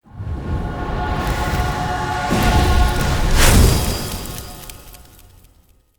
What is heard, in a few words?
Fire Sword Equip; Powers; Jotar; Soul Steal